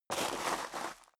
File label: step high heels gravel medium slide long; schritte; Footsteps